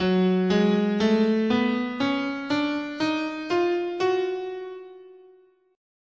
F sharp Bebop Melodic Minor, scale

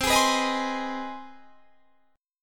C Minor Major 7th Sharp 5th, chord; CmM7#5; strummed

